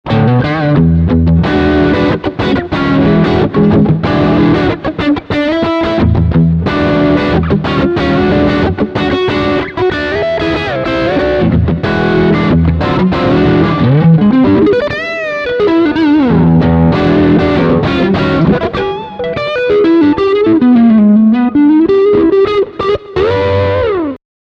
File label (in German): Frontpickup Crunch